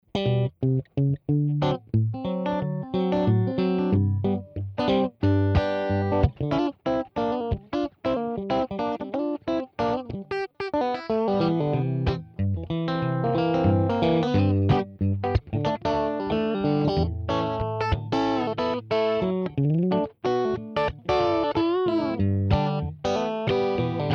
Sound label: fat, guitar